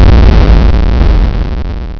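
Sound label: player hit; geo-cub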